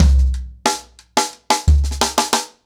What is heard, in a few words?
90BPM, ACOUSTIC DRUMS